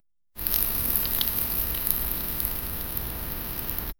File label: soft stirring sounds a ti; party